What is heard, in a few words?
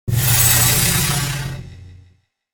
Electrical Blurb Glitches; 5.1 STEREO MIX; Blastwave